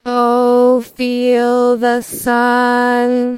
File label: singing